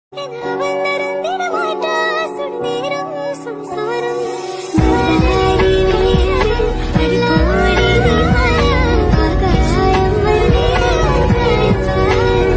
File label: speed up ringtone